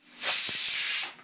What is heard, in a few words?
cooker whistle; nepali; grade1; kurakani; playtime sounds